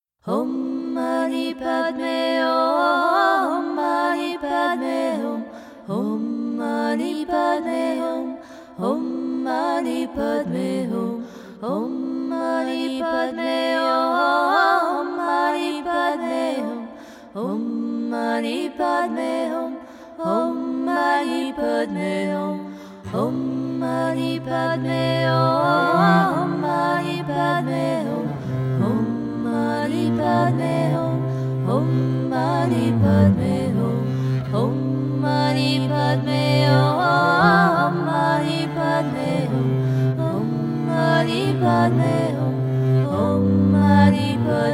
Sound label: Om Mani Padme Hum